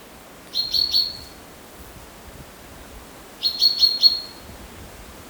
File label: chaffinch call